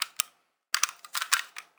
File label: Gun Handling Weapon, Weapons Foley; SFX Kit